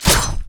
Weapon Sword Critical; Inotia 3

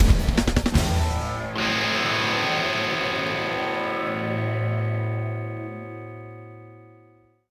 Action END

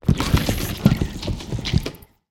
Swarm Inside Wall; silent hill homecoming 2008 gamerip pc ps3 x360

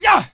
voice male attack; Infernal Radiation

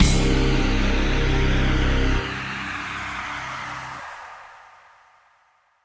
Retry Jingle; Classified; Windows; Funhouse

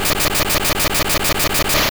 Horn Drill; sfx; attack moves; pokemon; gen 2; gsc

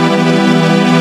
C minor Right; Angry Birds

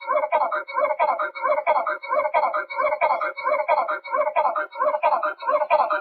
Brainwashing Signal; Lrad Brainwashing Signal1

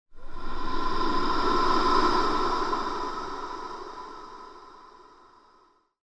short breathe

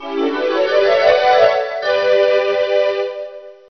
Level End; bonk s adventure arcade

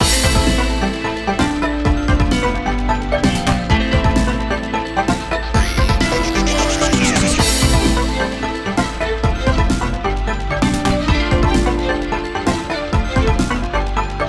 Waltz Instrumental; Friday Night Funkin' Funkin' Origins OST mod gamerip PC 2022

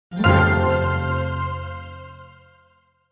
Level Up Full SFX

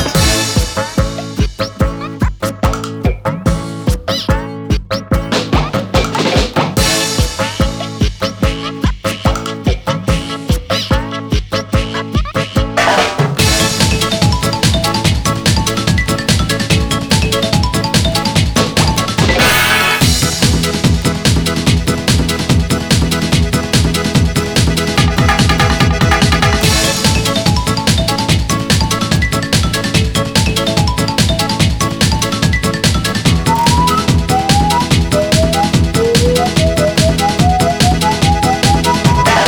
Tumbling Xylophone; billy hatcher

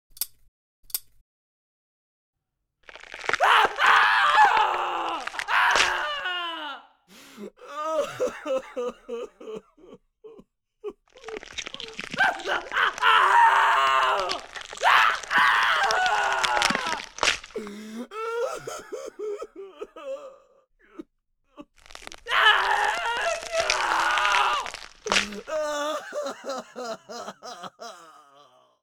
Extract Teeth; yesterday origins gamerip 2016 switch ps4 pc ios macos

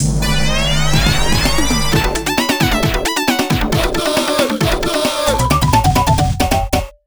Victory Dance; escape kids arcade gamerip 1991; Mt. Fuji Papa (Japanese Team Member's Victory Dance)

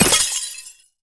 ice spell impact shatter

